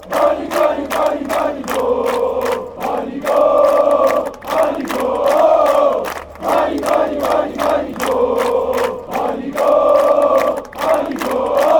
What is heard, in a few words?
banik gol, fan chants